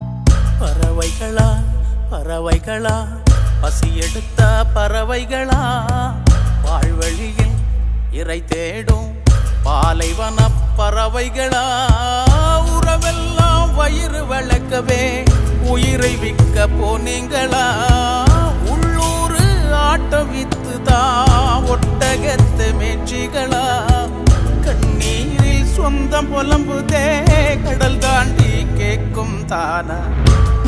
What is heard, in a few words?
Ringtones BGM, Tamil; Ka Pae Ranasingam